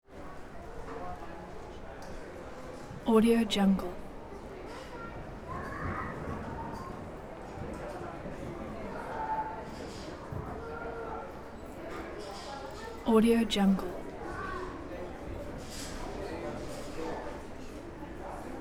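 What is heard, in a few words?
bookstore interior distant walla; ucla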